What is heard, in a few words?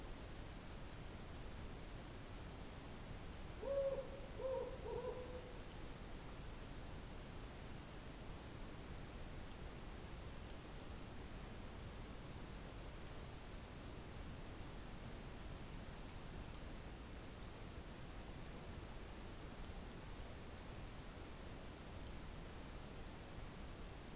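night crickets owl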